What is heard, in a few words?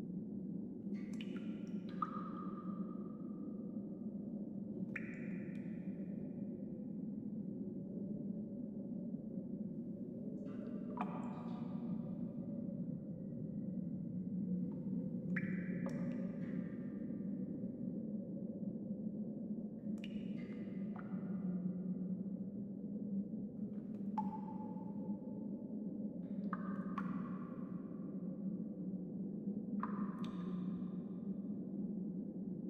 cave loop; Audio Game maker Sound Library; worldsounds